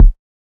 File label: kick C, hip-hop drum kit; 99Sounds x Monosounds; Moon